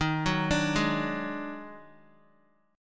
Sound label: Eb Minor Major 7th Double Flat 5th, down, Guitar; arpeggiated; 4F,4A,5C,5G,5C,6E; chord; JGuitar